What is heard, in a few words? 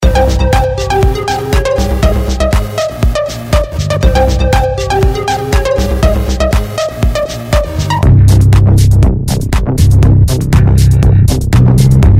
Break Bass